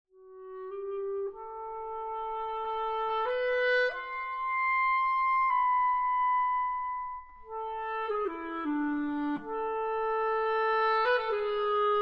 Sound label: for Solo Clarinet B flat Clarinet; Persona II Boris Lyatoshynsky; virko baley; multiple personae; the family of clarinets